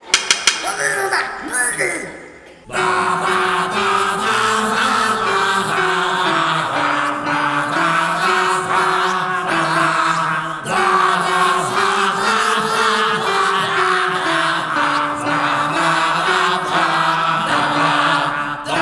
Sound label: Choir; rayman raving rabbids playstation 2; The Jukebox; Ubisoft Montpellier Choir